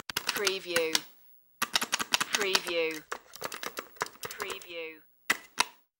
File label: Joystick